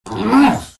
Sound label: exert; arc warden